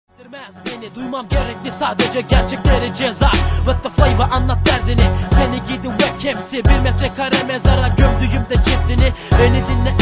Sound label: rap